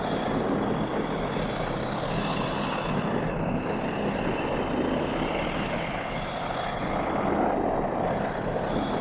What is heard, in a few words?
brood web loop; ambient; dota